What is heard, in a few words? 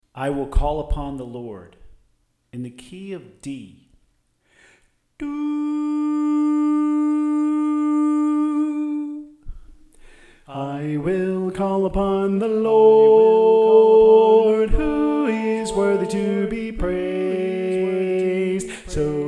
Tenor